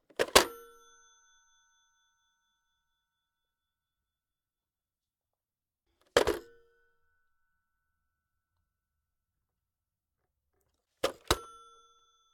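analogue rotary dial telephone put down holding receiver; sonniss gdc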